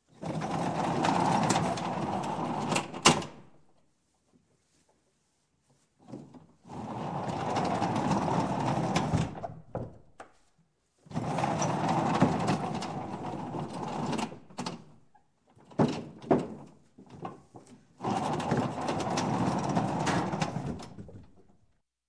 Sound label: Door, Wood Barn Door Slide Open And Closed; Twice; Sound Ideas 2000 Series